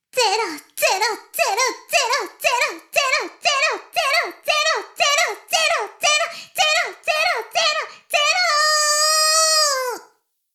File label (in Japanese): シコシコ音声; 古き城のドラゴニート2